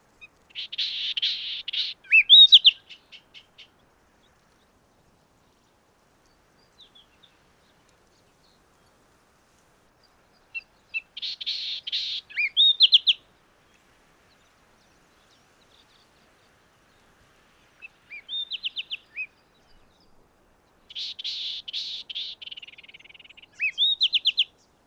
Chestnutvented Tit Babbler; eBird

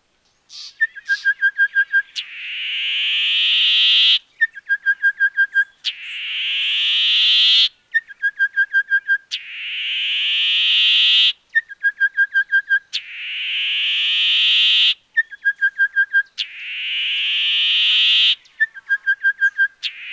Orange breasted Bushshrike; eBird

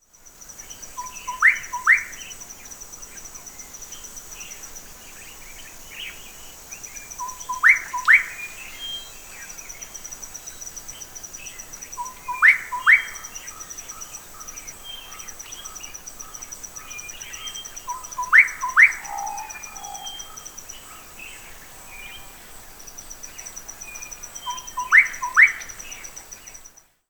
Gorgeous Bushshrike; eBird

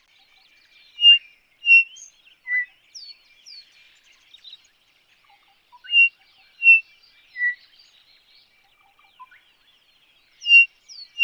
Chinspot Batis; eBird

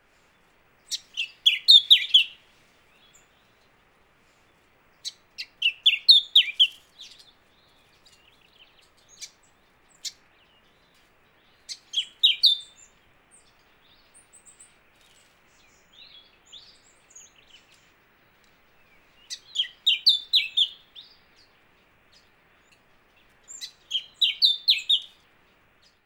Grey Sunbird

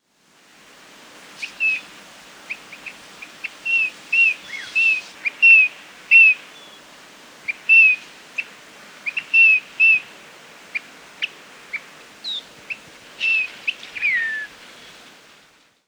Grey Waxbill; eBird

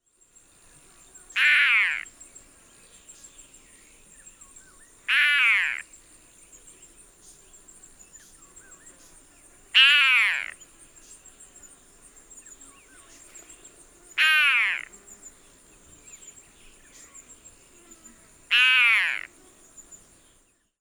Broadbilled Roller; eBird

